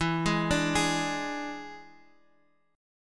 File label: E 7th E down Guitar Half Step Down